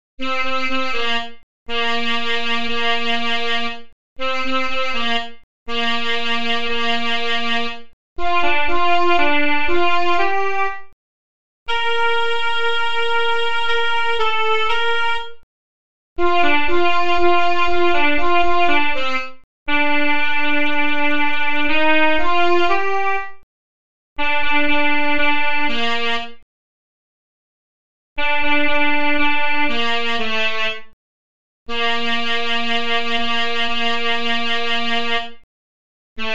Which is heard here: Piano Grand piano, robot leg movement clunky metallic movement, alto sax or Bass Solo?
alto sax